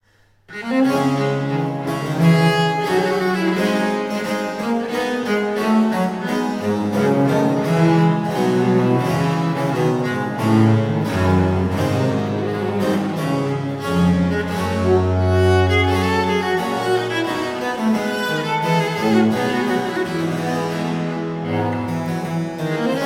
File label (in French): Suite En Ré Mineur; IIe Livre